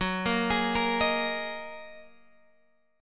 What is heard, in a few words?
F sharp 6th Add 9th F sharp Guitar Open G